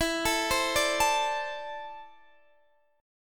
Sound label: E 7th Suspended 4th Sharp 5th, Guitar, DADGAD